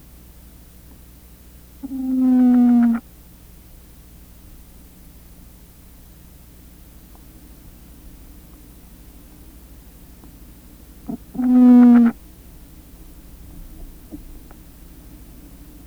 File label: Leptocentrus, treehoppers; Cocroft